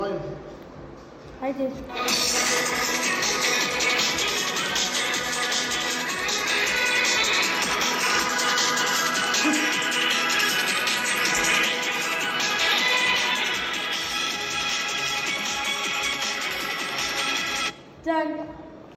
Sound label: william tell overture song